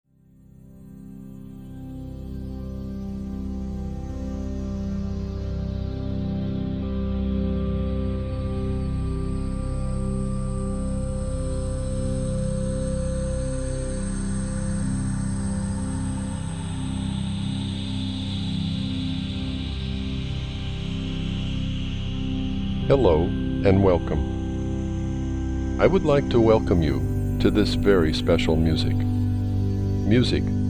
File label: Music Voice, Relaxation Music of Calm Reflection; relaxing music of Calm Reflection; Emotional Healing